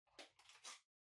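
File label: Ceiling Light Far remote control Cover Open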